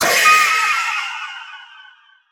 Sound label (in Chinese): 死亡声; 悦灵